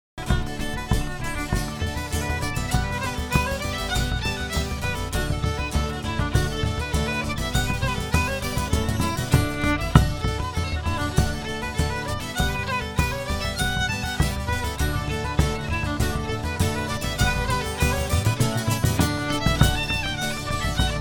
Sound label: REELS; The Maid behind the Bar